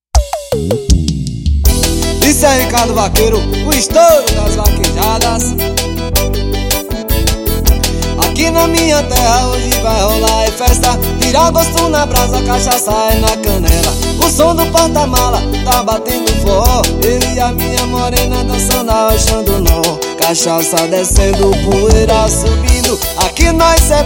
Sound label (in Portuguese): forró